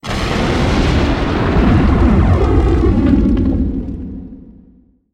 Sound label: Meteor Hammer channel